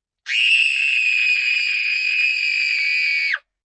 Scream, Scream, 1 child, long, raspy, human voice, kid; Screaming; VoiceBox